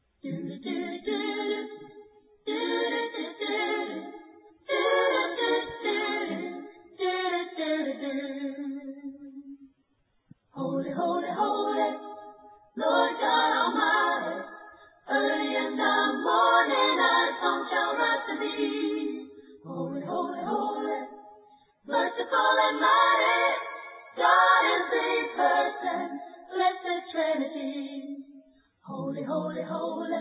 a capella hymn; holy holy holy

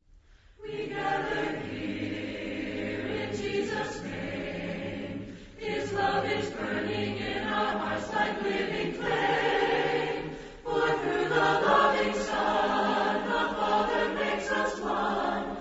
a capella hymn

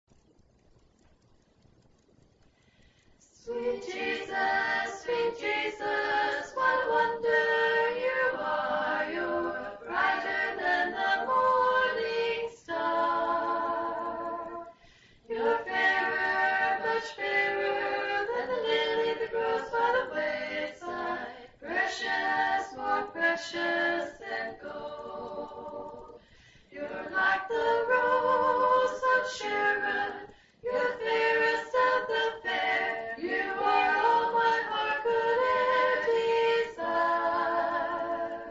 a capella hymn

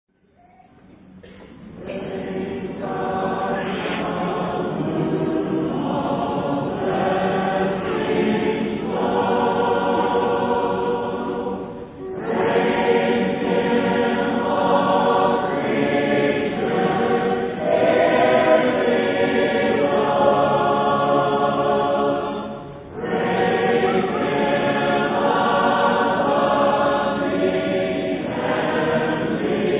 a capella hymn doxology

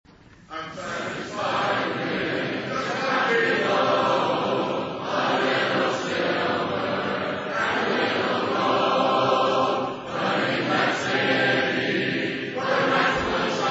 a capella hymn

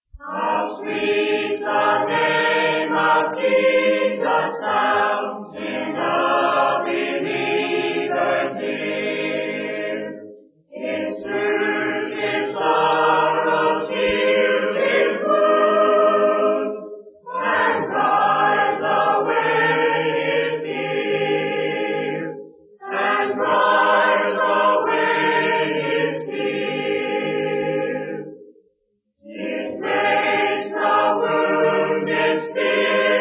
a capella hymn; how sweet the name of jesus sounds

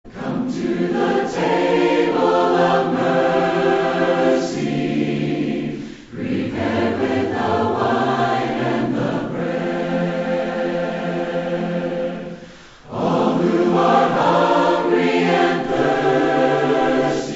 a capella hymn

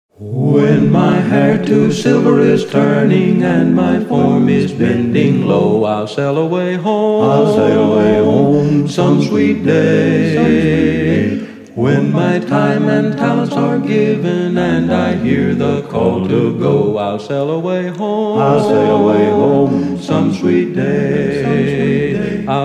a capella hymn; sail away home

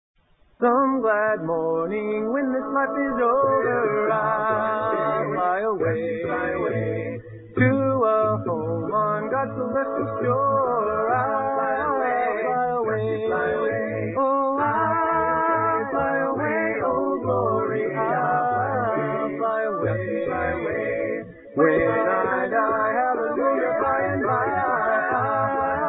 a capella hymn

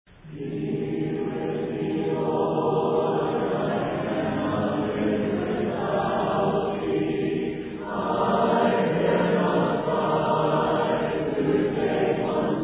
a capella hymn; be with me lord

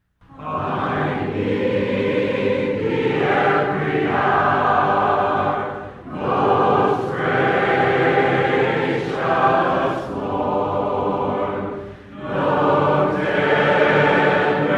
a capella hymn i need thee every hour

